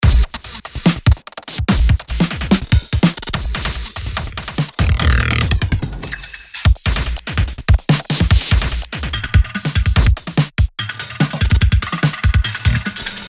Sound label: IDM; Muestra Samples